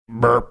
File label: burp